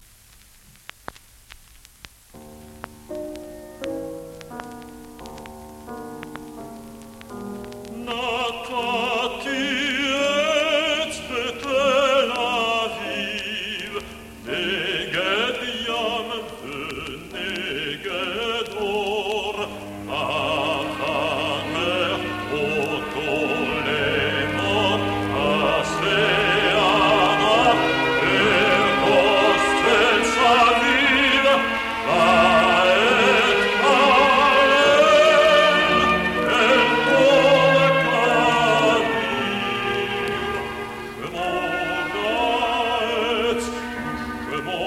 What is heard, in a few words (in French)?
chants d israe l